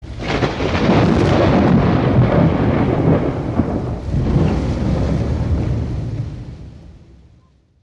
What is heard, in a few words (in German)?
Donner; Korngeister